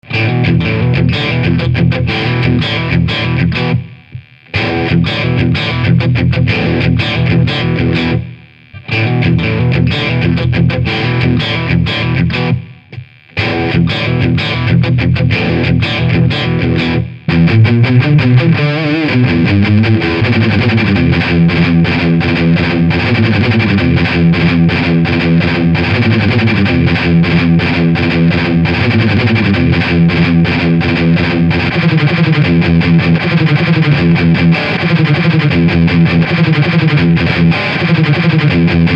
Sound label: acoustic